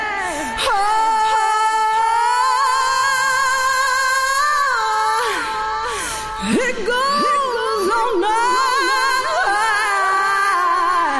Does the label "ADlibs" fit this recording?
Yes